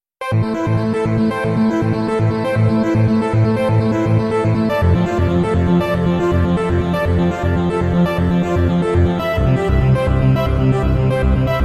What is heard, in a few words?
RAVE SIREN